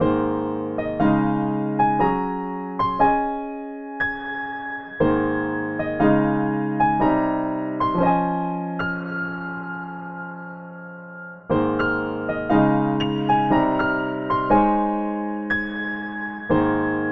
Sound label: music partly cloudy